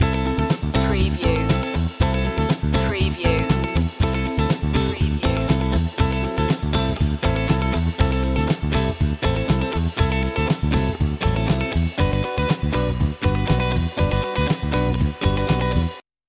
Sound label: philly shaft groove